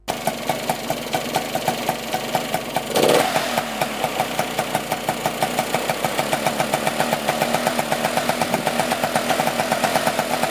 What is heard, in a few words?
Mobilette Crash